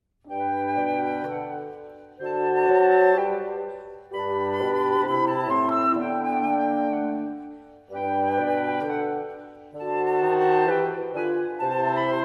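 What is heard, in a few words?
wind quartet; rossini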